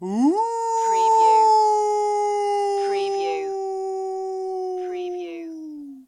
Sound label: Wolf dry